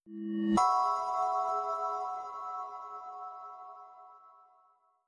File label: Group Call Invite; CiscoSparkLauncher